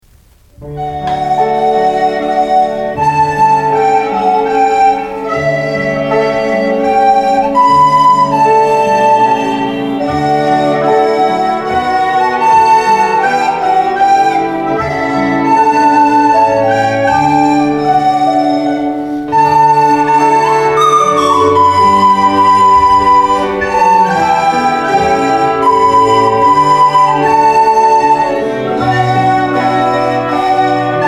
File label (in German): blockfloete